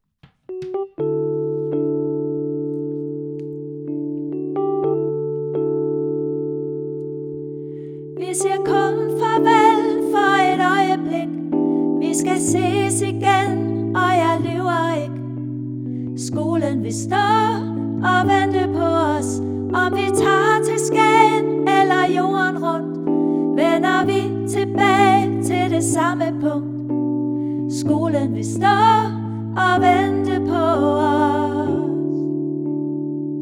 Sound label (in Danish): vi skal ses igen d dur; Sangbank